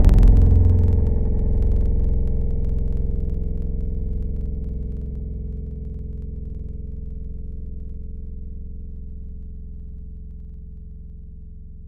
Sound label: Banjo; Synthetic's Serum Collection; Echo Sound Works Core Tables; ESW Real